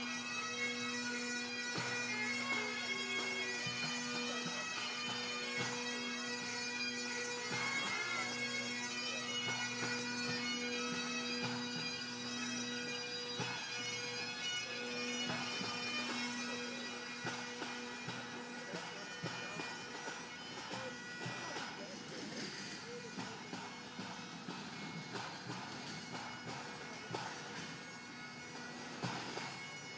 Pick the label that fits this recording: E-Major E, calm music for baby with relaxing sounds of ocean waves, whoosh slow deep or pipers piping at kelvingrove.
pipers piping at kelvingrove